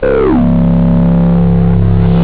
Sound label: synth; da capo vol1; instruments